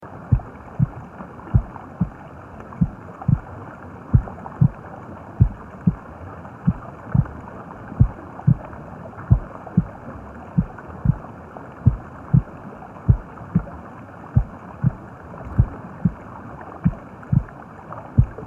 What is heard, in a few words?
womb